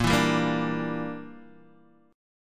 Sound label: A sharp 6th Flat 5th, Guitar DADGAD